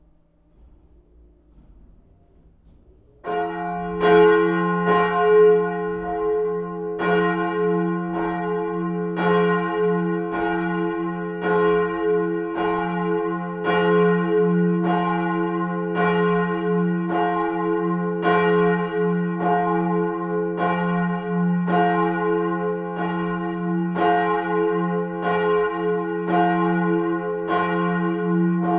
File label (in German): Glocke, Kirche Glocken; Unsere Kirche Glocken; Northeim; kg stsixti northeim